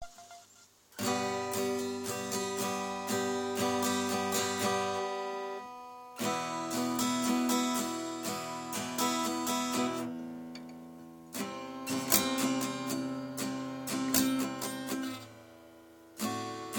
guitar softly new chord em

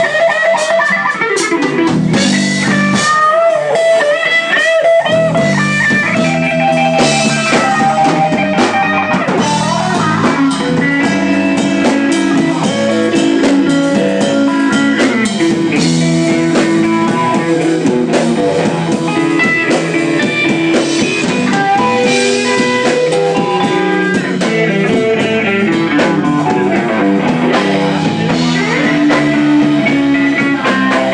Bass player Blues; An episode by Sweder

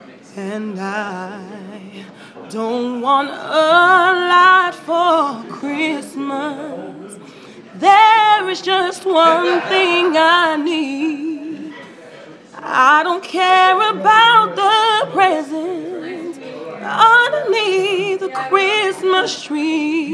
sung by the London community gospel choir; altitude london

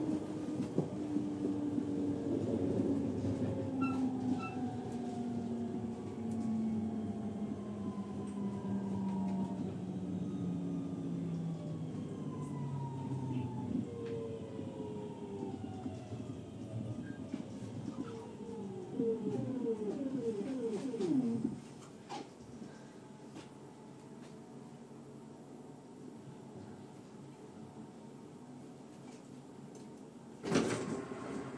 computer sci-fi train; to glossop

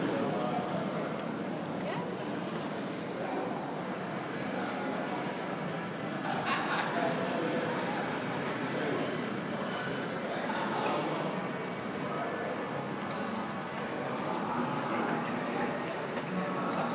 train station ambience

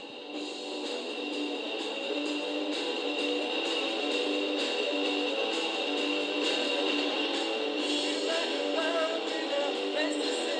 loud music for noise action week

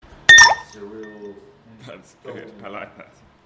ice cube being dropped into water in hotel bar; on sunday night

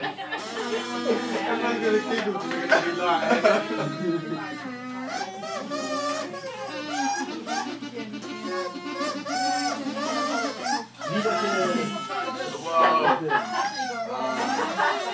doctoral students making straws into oboes

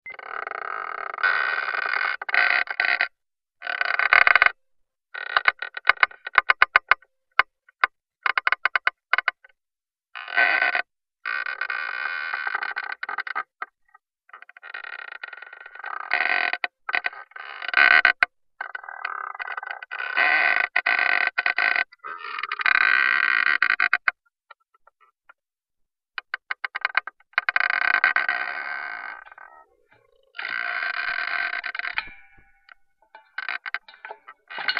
rockin chair blues

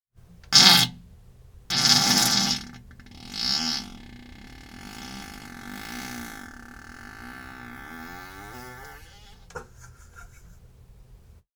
just a fart i did

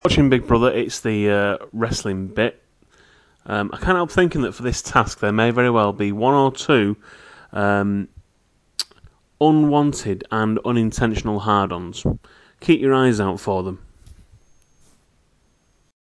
wrestling hard

